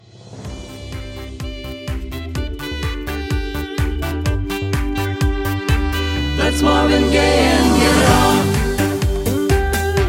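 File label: Instrumental with background vocals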